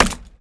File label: Chop Wood; Civ4 warlords